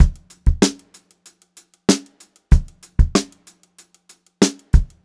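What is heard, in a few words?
STRAIGHT BEAT, Funk-Groove Drumloops; USB Soundscan vol.38; AKAI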